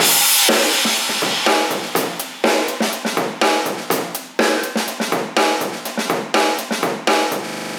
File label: 123 live breaks